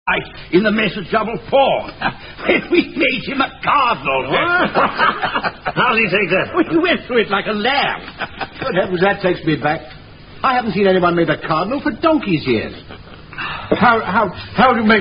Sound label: A Toast To Cardinal Puff, Are You A Cardinal Drinking Game; Dads Army